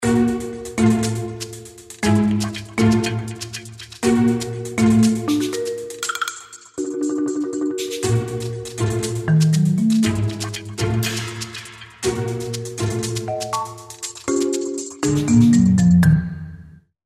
BAMBOO SUSPENSE

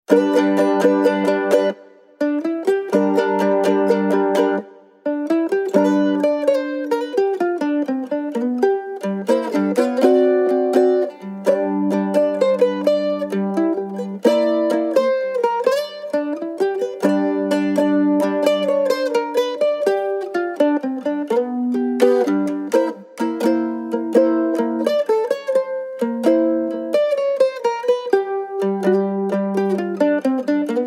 BLUES MOOD

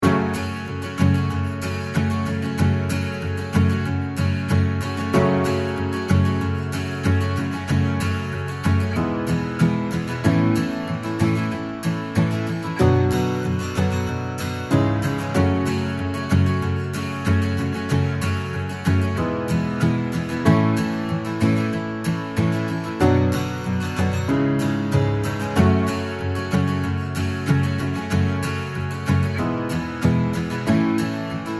NATIVE GUITARS

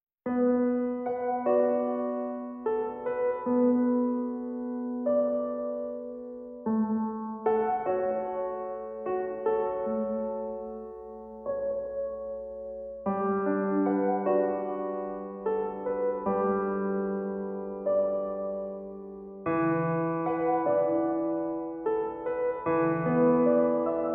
SOLO PIANO SLOW

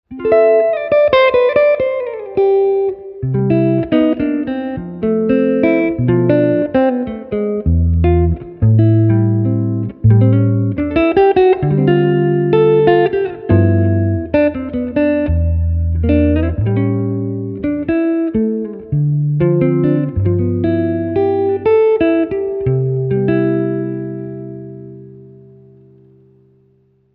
FINGERS AND WOOD

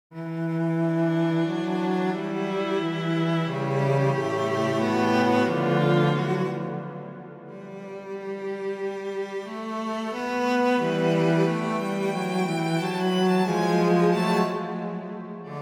cello alto music

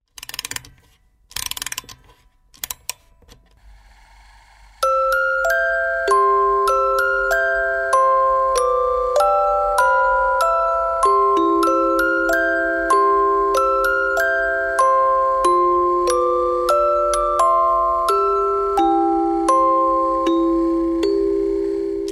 BABY TOY MELODY

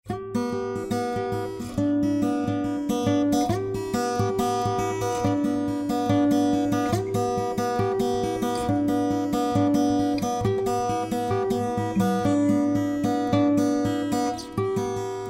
CELEST STRING

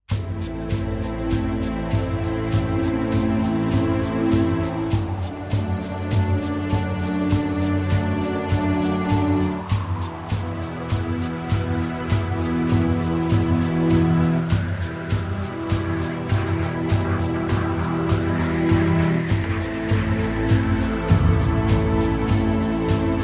OPENING STRINGS

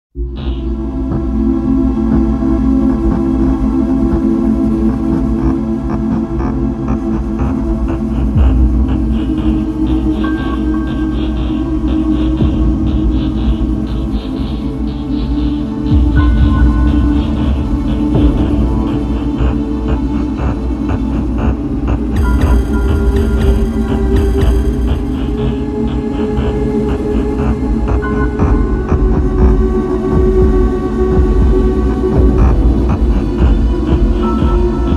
CLOCK AND FEAR

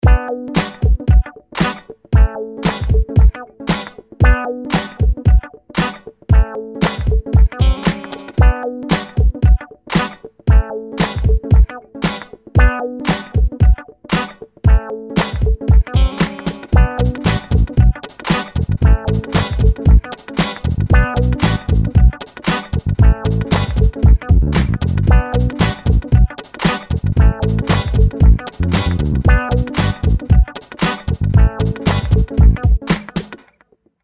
FUNK

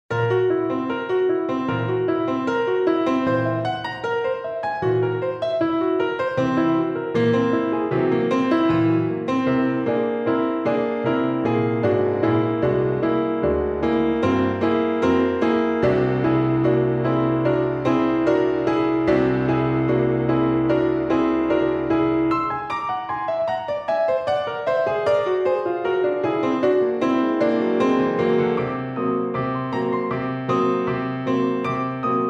PIANO

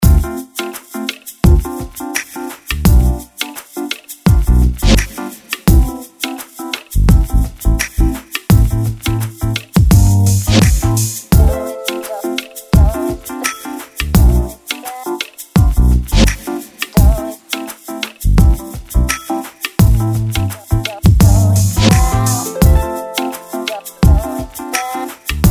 TOP MODEL TUNING

